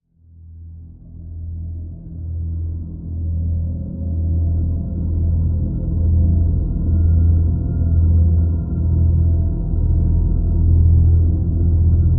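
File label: Drone Deadly E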